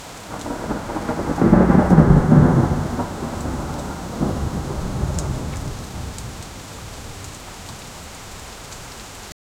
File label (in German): heftiger Gewitterregen